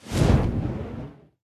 Hero Flames Loop start; Beresad

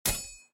sword Metal

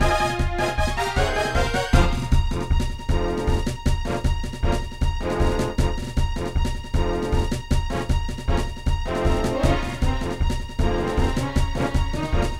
Boss Battle, Attack; Pikmin 2

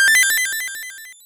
Jingle 1up; Mr. Driller Drill Spirits; nintendo ds

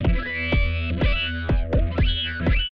buildbot dance boogie; Space Otter Charlie

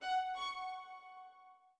orbit violin; Sky Children of the Light gamerip

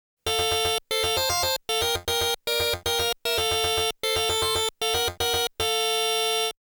Start Music; Xevious; Namco Classic Collection Vol.1; arcade

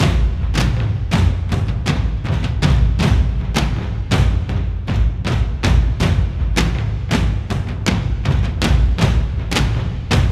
Drums; For Honor

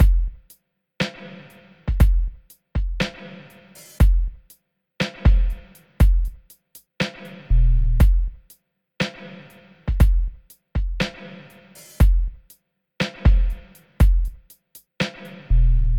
beats; Toki Tori 2+; Toki Tori Collection

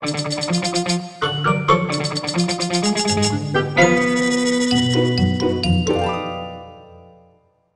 Bari Sax

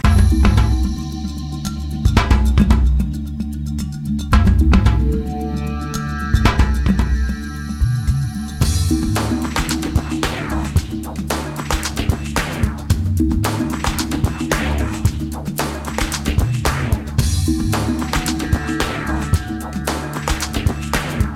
Game Music; beta bloc; psp

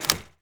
close sash

